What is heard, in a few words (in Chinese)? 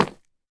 深板岩砖踩踏声